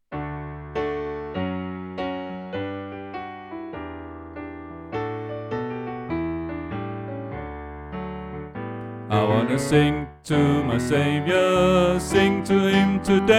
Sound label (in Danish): Tenor; Gospel; oevefiler